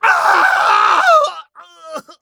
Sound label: agony; Escape from Tarkov